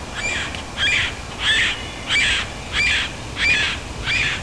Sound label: sapsucker, birds